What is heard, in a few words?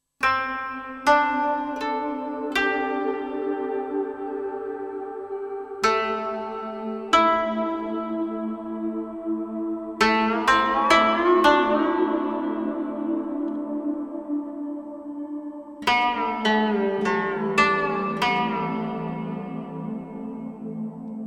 Koto; NADA Instruments